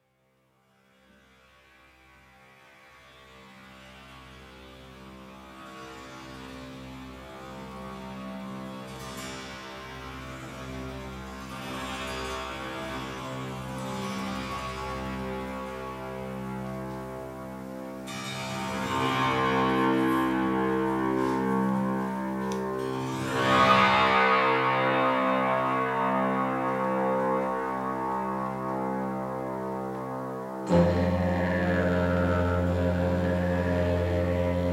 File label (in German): liege didge